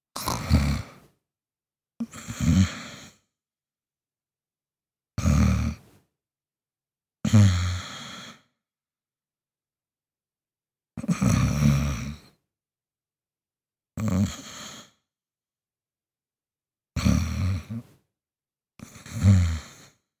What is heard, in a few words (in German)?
schnarchen lang